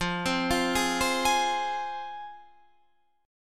F Minor, down, Guitar, Half Step Down

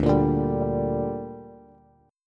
D Suspended 4th Sharp 5th D down Bass, chord; strummed

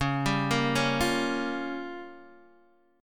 Db 6th Flat 5th Db down Guitar DADGAD

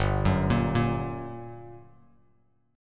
G Minor Major 7th, Bass Standard; GmM7 arpeggiated; 3,9,8,3; JGuitar

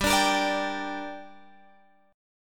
G Minor G down Guitar Open G, chord

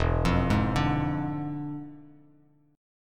E 7th Flat 9th, Bass Standard, E7b9 chord; arpeggiated

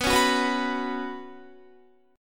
B Suspended 2nd, Guitar; Bsus2; strummed

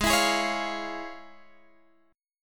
F Minor Major 7th Sharp 5th, chord

